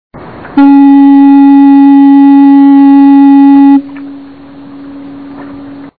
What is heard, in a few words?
electric horn; Georges Island